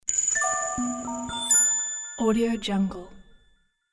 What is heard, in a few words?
flash magic slide and bing